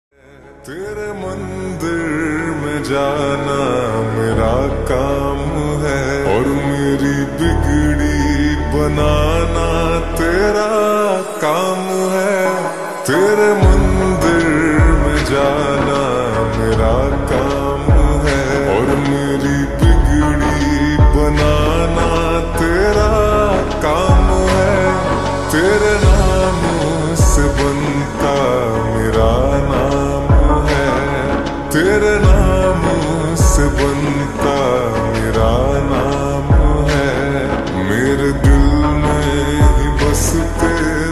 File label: Slowed Reverb Ringtone